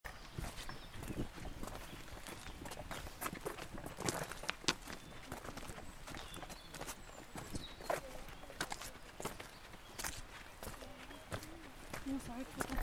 AMBIANCE MARCHE SENTIER; college vallee du thore

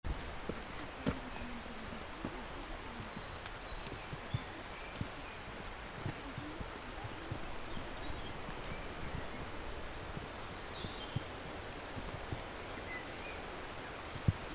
ambiance oiseaux; college vallee du thore

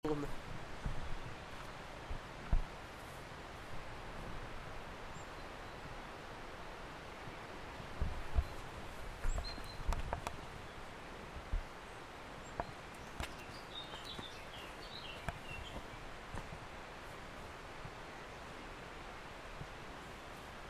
AMB OISEAUX ET SILENCE; college vallee du thore

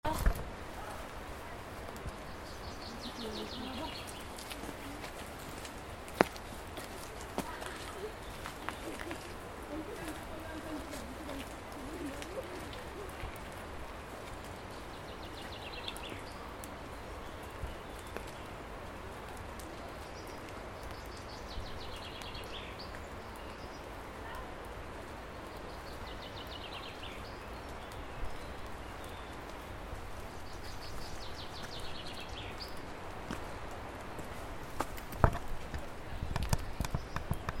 AMB OISEAU SUITE; collège Vallée du Thoré

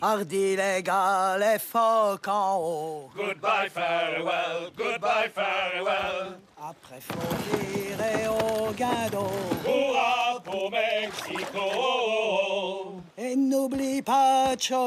Chanson des marins; L'île de Black Mor

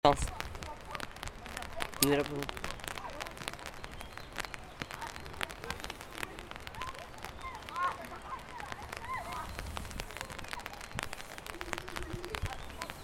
AMB PLUIE ET CRIS AU LOIN; college vallee du thore

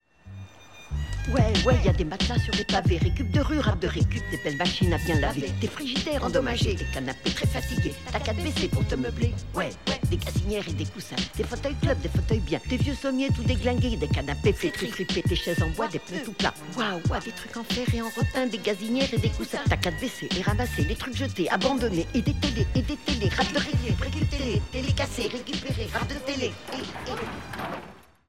Rap de recup; Les glaneurs et la glaneuse

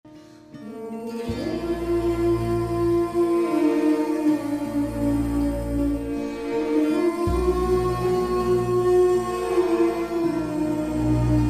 choeur de femmes; Fin Parvana

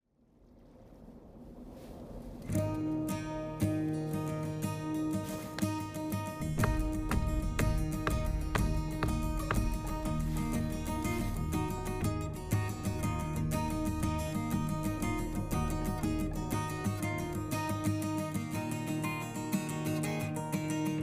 Musique; Il pleut bergère

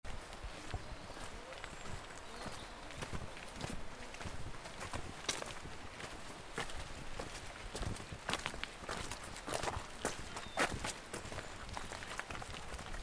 AMBIANCE NATURE PAS; college vallee du thore

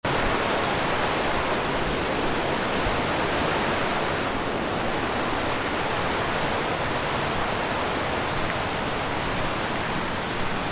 AMB SENTIER PASSERELLE SUITE; collège Vallée du Thoré

